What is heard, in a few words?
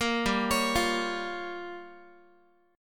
G Minor 7th Flat 5th, Guitar; Gm7b5 arpeggiated; JGuitar